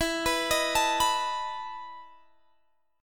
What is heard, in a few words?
E Major 7th Suspended 4th E down; EM7sus4 arpeggiated